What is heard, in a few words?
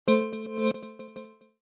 Sms Tone